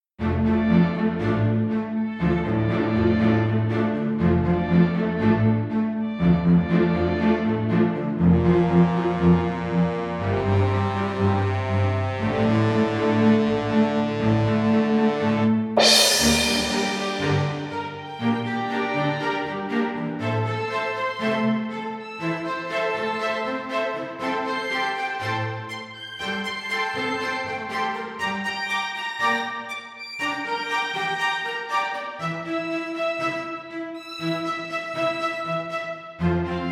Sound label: classical music